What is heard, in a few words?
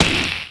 Melee Magical Light; Kilta